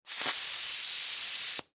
Air Expel Hose Valve Release Pneumatic Burst Tool Slow; Air Pump; Air Pumps; Industry; Blastwave